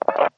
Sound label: radio chirp end